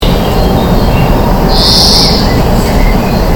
grünfink; Mag. Peter Holub

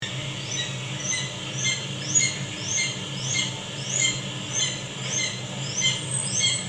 wanderfalke; Mag. Peter Holub

